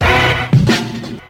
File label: owoo, Drum loops Kick Snare